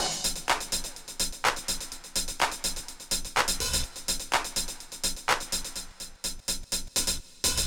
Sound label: disco drum loop; DRUMLOOPS; Best Service ProSamples vol.48 - Disco Fever; AKAI